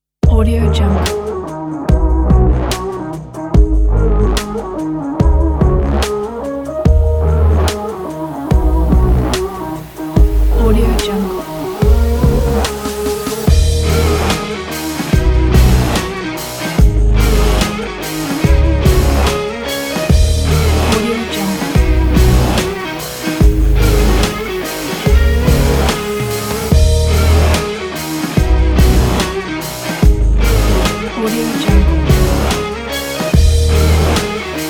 stylish sport rock pack

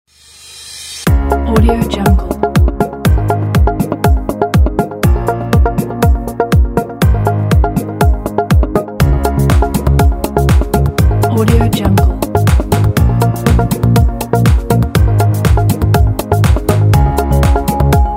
commercial corporate

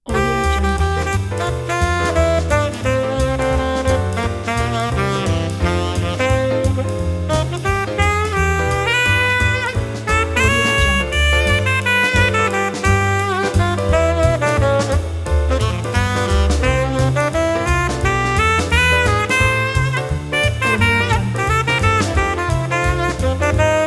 happy jazz

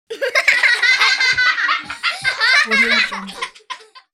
children laugh

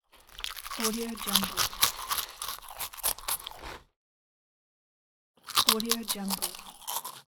eating crackers

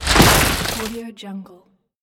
stones falling on ground

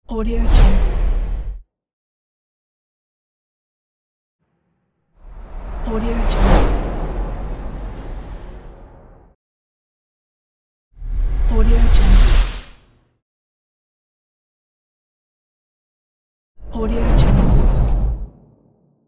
swoosh

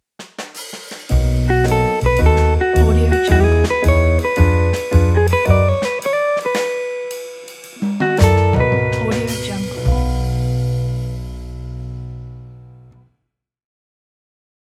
jazz blues intro; korolkov

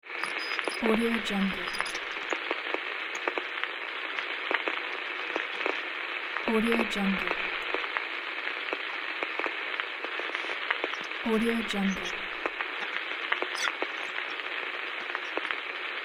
radio noise

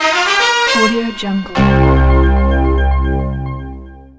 quiz game show break

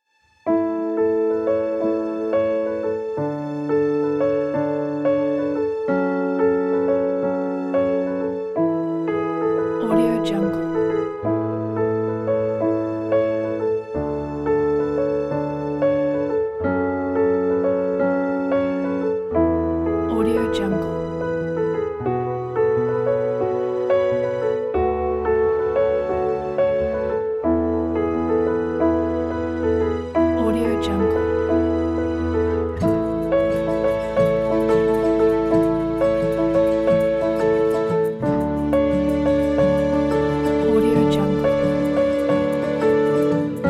gentle emotional piano

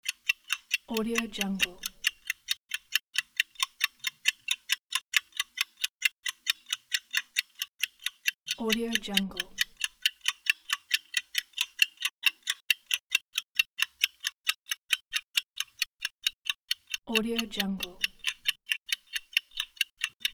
clock ticking

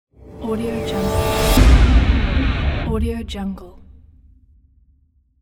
cinematic guitar logo reveal whoosh

